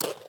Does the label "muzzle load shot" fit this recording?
No